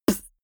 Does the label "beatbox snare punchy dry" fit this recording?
Yes